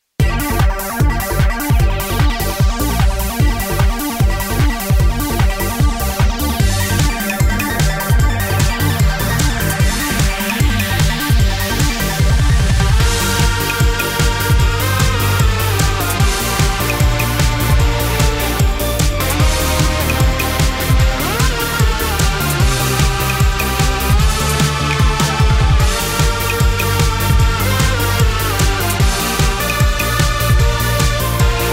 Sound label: electric battle